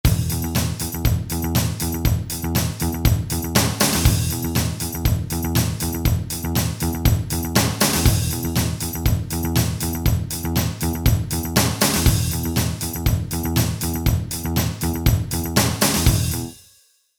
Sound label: Rock